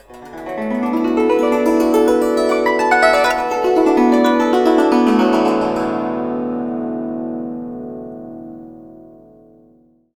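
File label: guzheng; Spectrasonics; Heart of Asia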